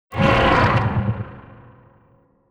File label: pain